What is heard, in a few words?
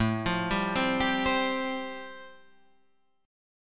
A-Minor 7th Sharp 5th, Guitar Half Step Down, chord; Am7#5